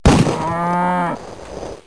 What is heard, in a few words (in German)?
hirsch